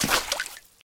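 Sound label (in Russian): сильный всплеск от брошенного якоря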